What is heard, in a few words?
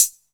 dark tone pedal hi hat f sharp key; drum single hits one shots; hat samples